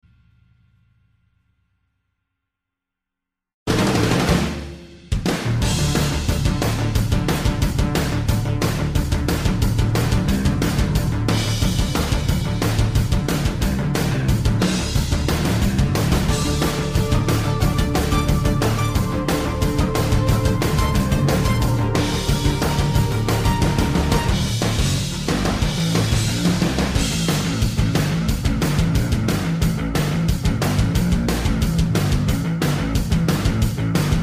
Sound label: Heavy Metal minus